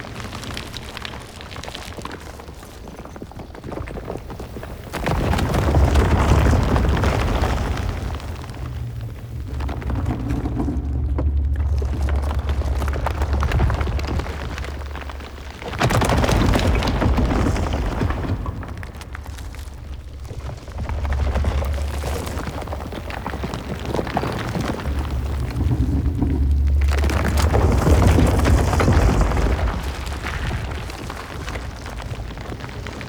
Structure Rock Creak Loop; Warframe; Man In The Wall

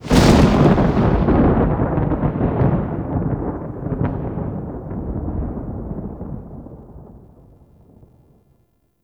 Plains Thunder Clap; Warframe; Eidolon Plains; Ambience

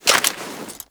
Equip, Weapons; Warframe; Tenno; Soma Kama

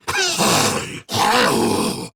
Laugh Grineer Ghoul Sargeant

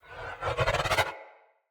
Great Sword Range Rune Charge; Runes

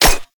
Vial Ability Orb Spawn; Warframe; Warframes; Alchemist

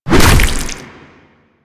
Infested Ancient Tentacle Swing Hit; Warframe

